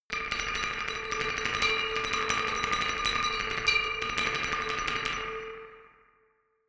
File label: glockenspiel dark tremolo low